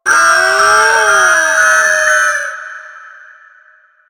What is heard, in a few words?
Large Vehicle Alarm Destroy; Warframe; Ambience; Nineteen Ninety Nine